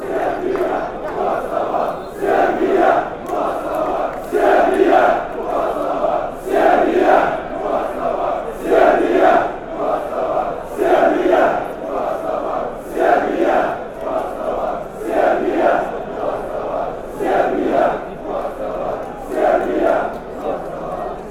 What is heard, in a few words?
chant; spartak